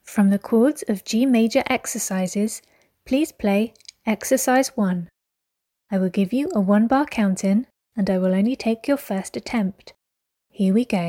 chords of g major

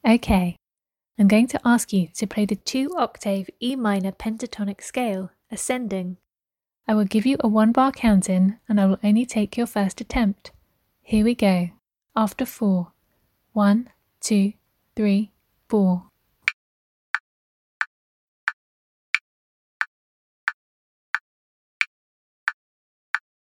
e min pent ascending

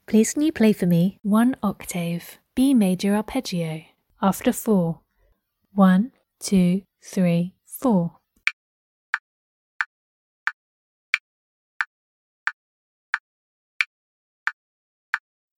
b maj arp

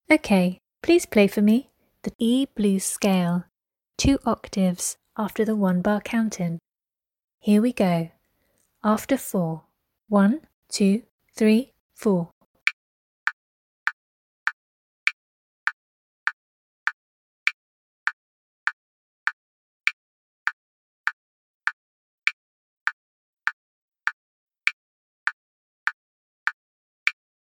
e blues 2 oct

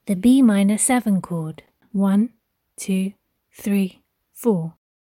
b min7 chord

